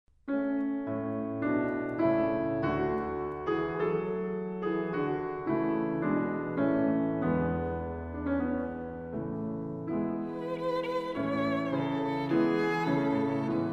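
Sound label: violin sonata; franck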